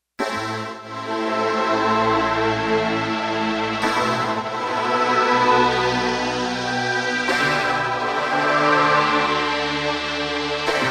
wavetable; Digital Synthesizer

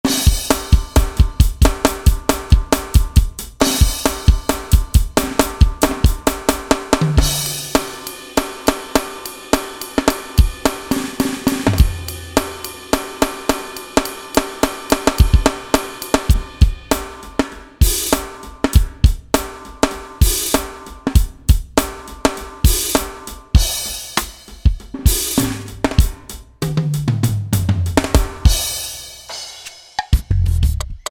Hi Tune Kit; Roland SRX-07 Ultimate Keys

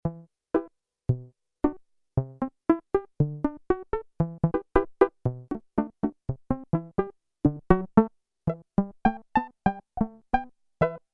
PIZZICATO

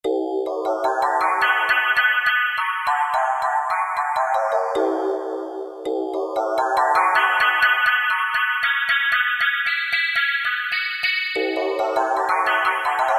Jamisen; Factory Patches

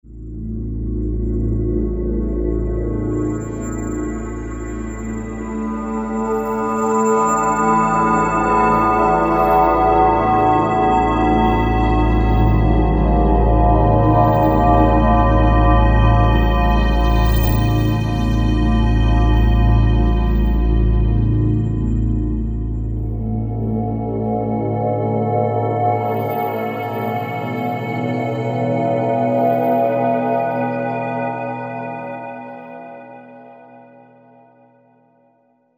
SYNTH TEXTURES Ambience; Izmir Ambience; Apple GarageBand 2

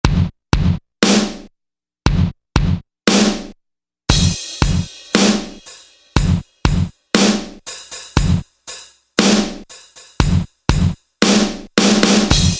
Kit

